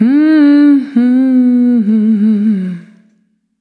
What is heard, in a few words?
Single Voice Melodic Loops